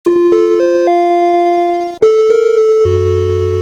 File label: crash powermac lc